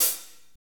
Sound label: HAT, Drumscapes Roland; Northstar - Drumscapes Roland